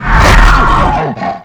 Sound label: pinky attack melee, monsters vocalizations; DoomRTX